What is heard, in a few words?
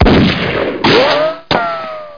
BULLET